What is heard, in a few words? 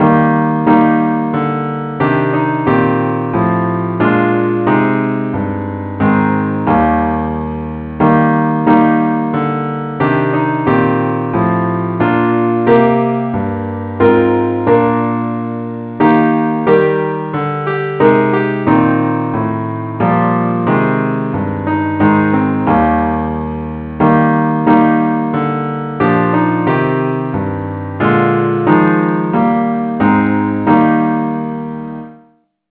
parts only key ees